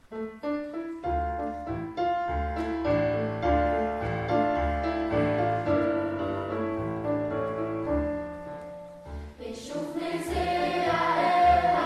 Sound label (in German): Unterstufenchor und Chor; El Haderech; Shimir Or